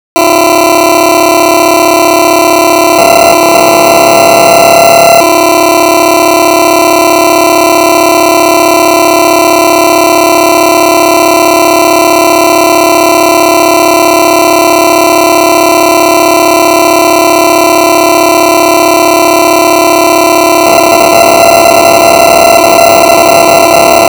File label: Fireplace; Galaksija; Emisiones Radio-Games